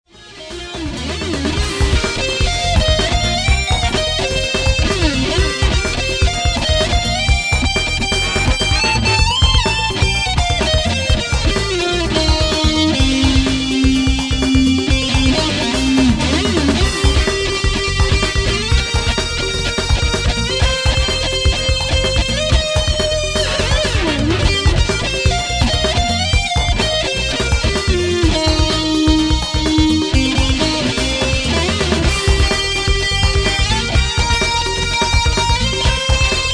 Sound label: Funk